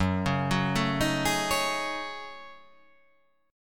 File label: F sharp Minor 6th Add 9th; chordshape; down